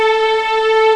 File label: STR p, STRING; Best Service Gigapack 1